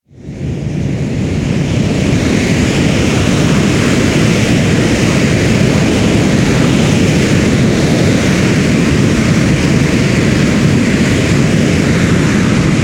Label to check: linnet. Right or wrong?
Wrong